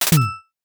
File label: camera shutter pro